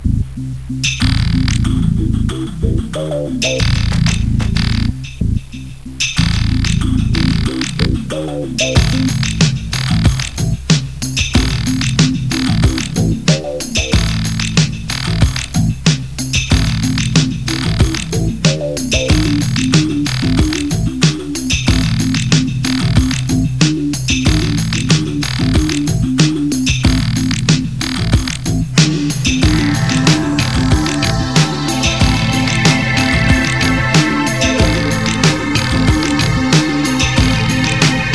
BG MUSIC

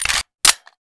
pistol clip in, weapons

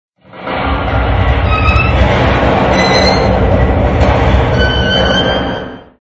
metal noise; ambience

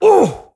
Horseman Wound; svencoop; keenhalloween; horsemen

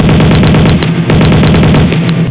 plane gun; breakout; dod

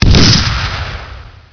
grenade boom; gearbox; demoman

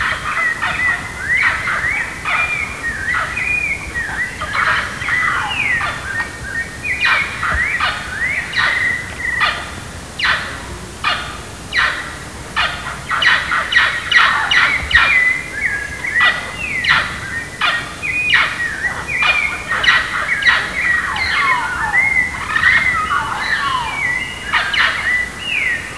jungle night; svencoop

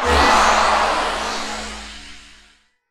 crowd death; svencoop; thearena

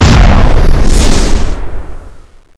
magic missile; wizardwars; spells

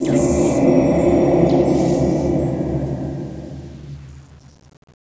starfall; svencoop; sc frostfire

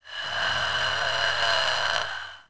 li idle, licker

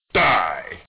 die; svencoop

